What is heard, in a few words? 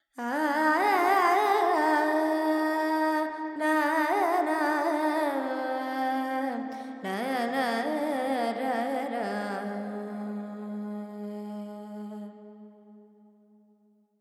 Carnatic Female Alaps, Lydian, 70 G, Verb